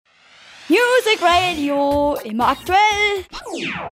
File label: Jingle